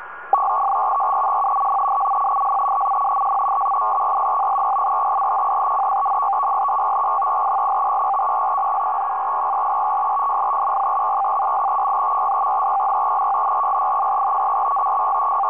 SUI-MIL 220 Bd PSEUDO#; TmS-430; phased out?

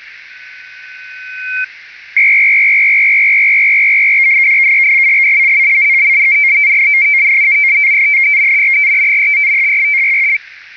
GMDSS, GMDSS DSC; ITU-R M.493 compatible

